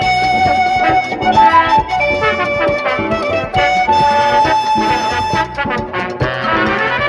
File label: horns intricate patterns, Phonky loops